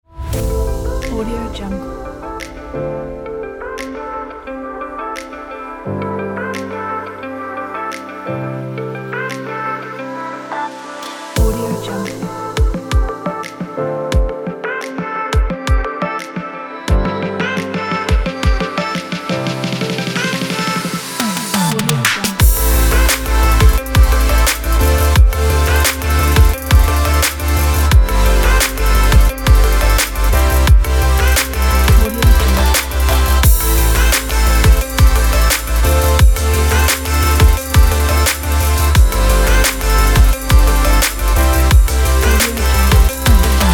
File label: future bass; selectedsounds